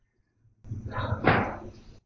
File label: 2nd Floor Bedroom Adjacent to Servant Staircase EVP Amplified